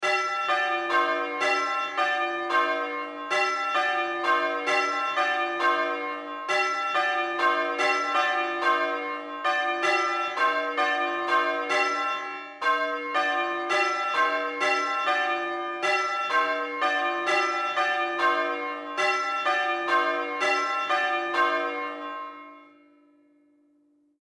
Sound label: church bells, fleggburgh st margaret, norfolk